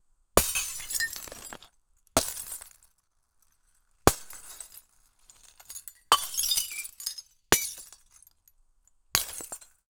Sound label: muffled broken glass; destruction